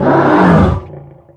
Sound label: injured